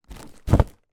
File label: foley gift present wrapped box place down on floor